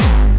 Dream Bass, kicks

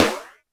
snaredrum, Yamaha RM 50

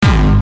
twisty hard bass

